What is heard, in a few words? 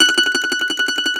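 Mandolin tremolo, Plucked Strings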